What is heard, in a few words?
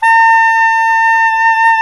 SAX SOP SAX, SAX Soprano Long